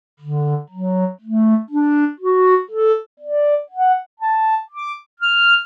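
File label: klarnet in B, clarinet in B-flat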